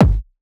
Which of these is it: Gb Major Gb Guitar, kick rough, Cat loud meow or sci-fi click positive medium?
kick rough